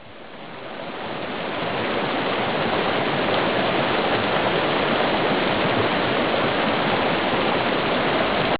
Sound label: rapids